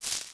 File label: Mali Beads; Civ4 warlords